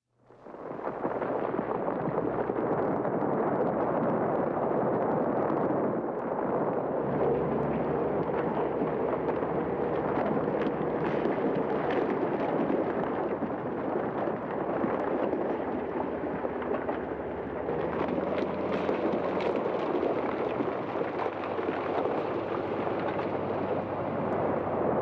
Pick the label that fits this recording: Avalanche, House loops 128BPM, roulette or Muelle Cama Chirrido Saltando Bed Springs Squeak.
Avalanche